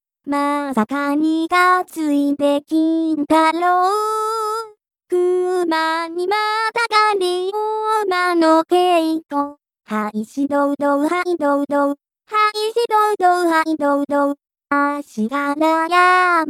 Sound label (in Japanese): kintaro voice